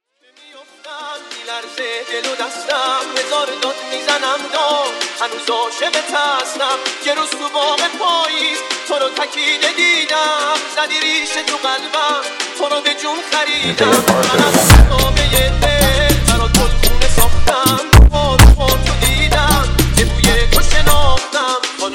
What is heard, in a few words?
Funk